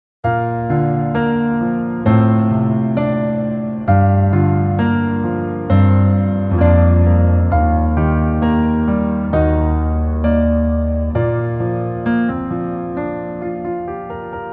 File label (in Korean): Piano ver 멜로디MR